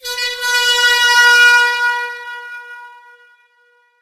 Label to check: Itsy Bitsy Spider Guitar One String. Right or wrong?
Wrong